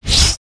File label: heat seeking missile; LoL; urgot